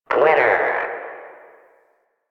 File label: Arcade Voices; Warframe; Ambience; Nineteen Ninety Nine